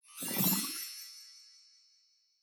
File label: UI Powerup; SandBox Musics